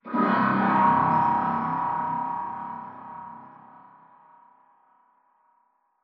strider distant; ambient; combine

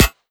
metal prop, bullet impacts; physics; Half Life Model Pack

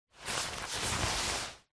foley jacket

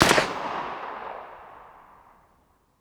ump45 distant, weapons; Counter Strike Model Pack; CS:GO

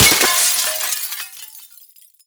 break glass phys large; physics; breaking

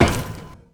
ladder, footsteps

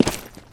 concrete, footsteps

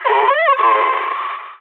death, balkan; agents

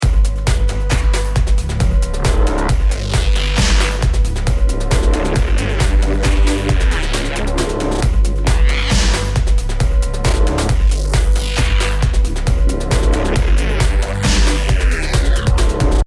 start action, music

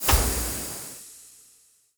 steam shufoff; Half Life Alyx

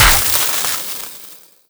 spark sparkles

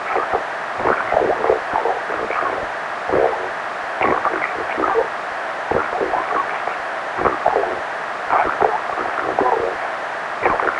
combine radio idle; soldier common

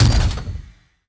construction step, strider; creatures; Half Life Model Pack

